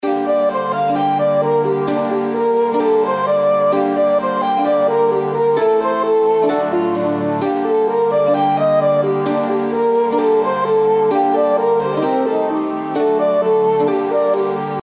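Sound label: outro background music, journey to west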